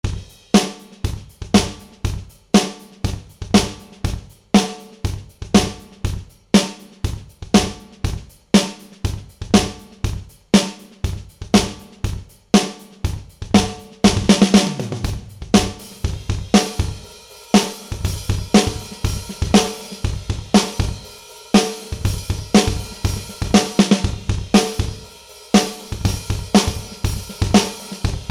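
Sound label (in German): Tverbs sepatat für Snare, Bassdrum und Blech; Die Snare habe ich nach vorne geholt, Bassdrum, Hi-Hat und Becken im Raum verteilt; Eventide Tverb; Superior Drummer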